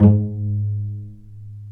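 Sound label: strings cello pizzicato, dry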